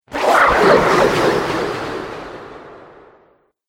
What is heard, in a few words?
Alien Slurpy Atmospherics; Alien Sound Effects